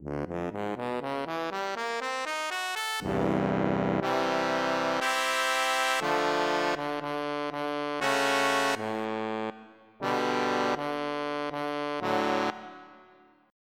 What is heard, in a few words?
Trombone; FF7